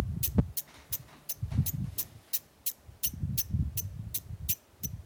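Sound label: Lasiopodomys gregalis juvenile; Narrow-headed vole; Lasiopodomys gregalis raddei; Series of discomfort calls of a two-day-old pup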